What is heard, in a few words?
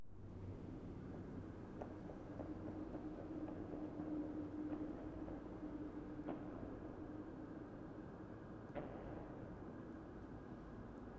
corruption, ambient; minecraft